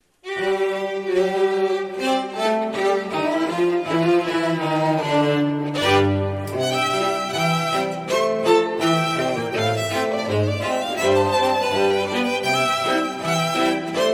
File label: Country Club Rag, Strings